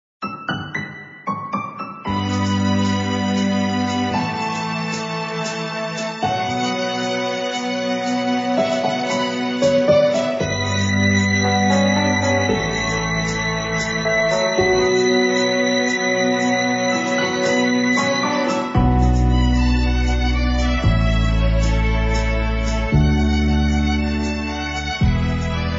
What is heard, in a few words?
Instrumental